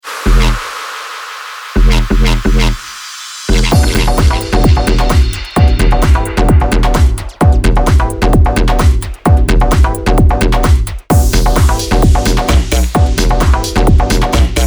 ANALOG BASS

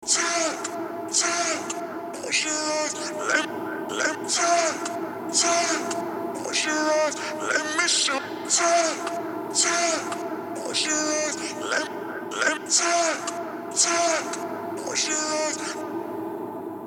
114 Eb Hush Vocal

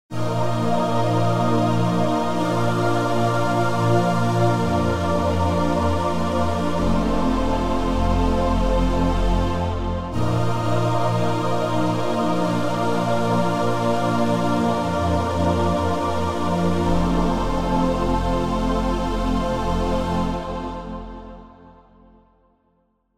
Pad Choir; BLUE II

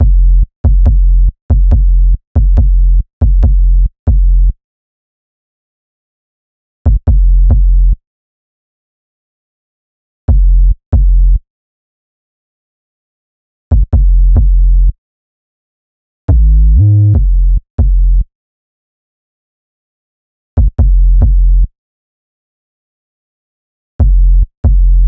Bass Dry; TrapDrive

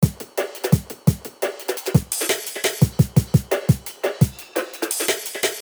172 Drums Full

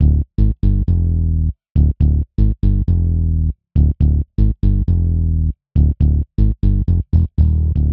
120 D Bass

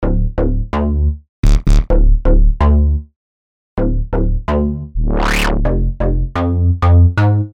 128 D Bass

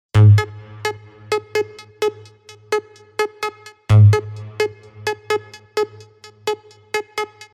Synth

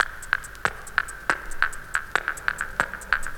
Electro Snaps

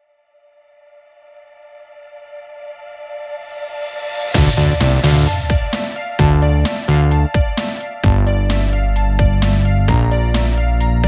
tape synths; Zampler

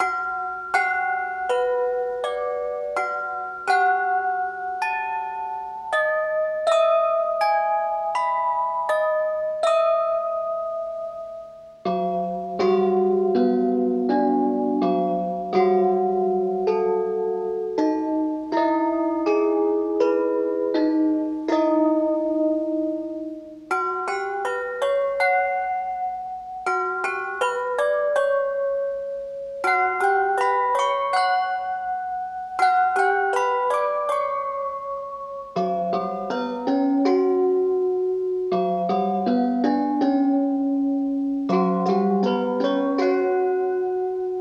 Cassette Mallets

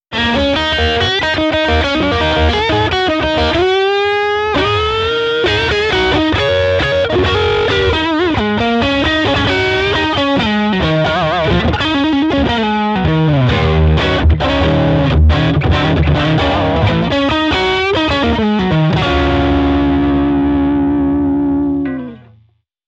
Blues OD - Solo FX ON

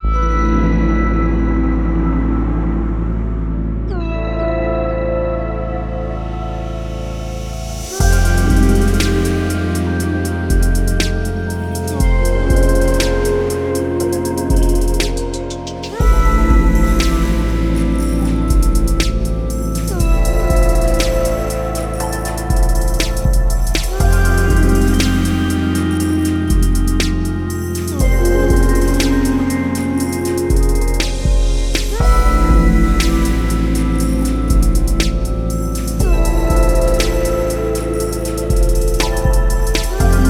Khords

